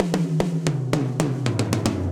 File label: Afrobeat Fill 113bpm; Cop Style; Ableton; Factory Packs; Session Drums Studio